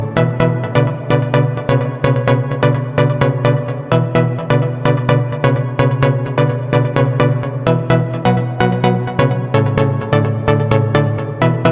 electro dance house free tone ringtone

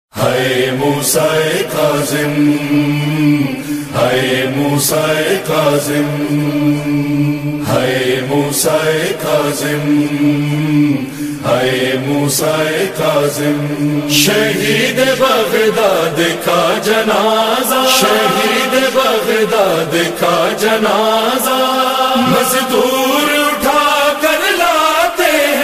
nauha ringtone

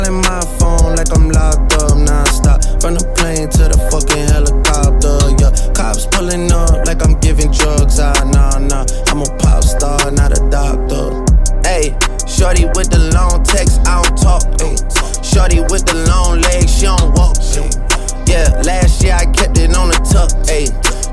english music ringtone

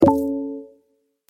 ringtone, samsung sms; ceres

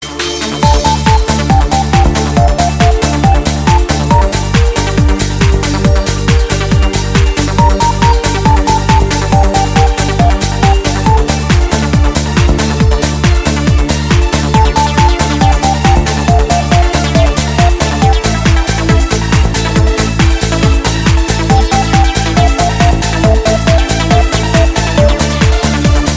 techno remix ringtone

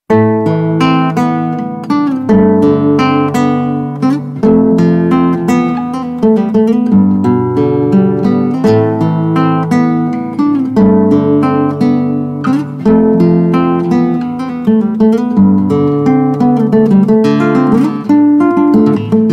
bollywood guitar ringtone

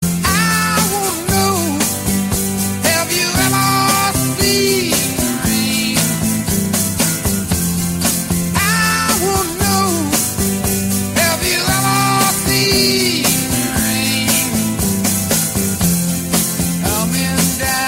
english song tone ringtone

